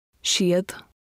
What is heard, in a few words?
Key Sounds